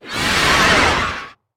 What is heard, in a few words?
Space Demon Horse